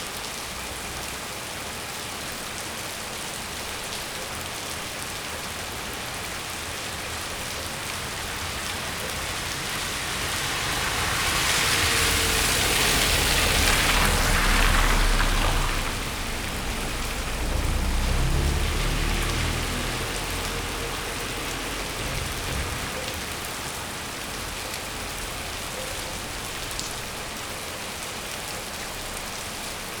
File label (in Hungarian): bezárt pékség terasza balról egy autó balra kanyarodik, eső, Potamia